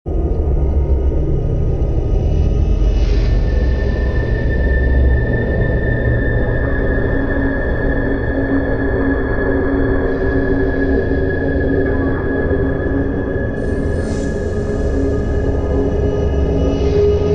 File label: menu game over